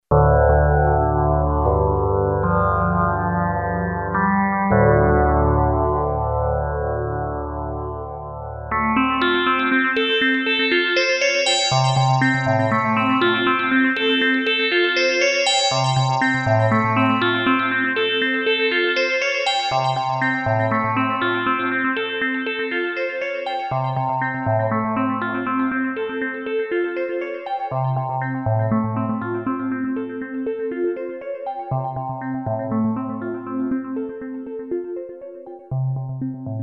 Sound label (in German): Wavetables u Formant